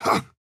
Attack Small

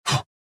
Punch

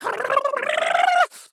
Charge; Gizmo

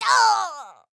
AHurt; Harley

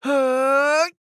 Charge; Fern

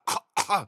AHurt

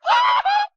Taunt

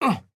Land; Fern

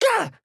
AHurt

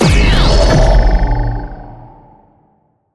Boom Tube

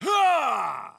Attack Extreme; Batman

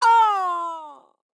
Garnet Death

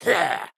Land

